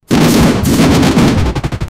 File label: short battleroar of a gro; battlecry